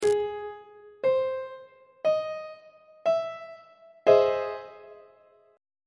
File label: f flat augmented major 7th 1st inversion on treble clef